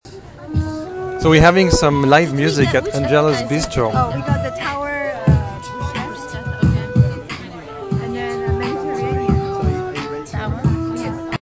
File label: live music at bistro; angelica's bistro